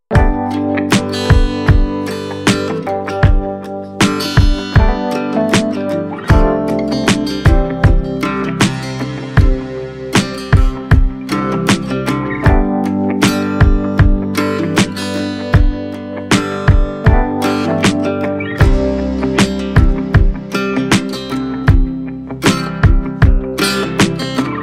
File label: Ram Ringtone, Bhakti Ringtone, Instrumental Ringtone; Jay Shri Ram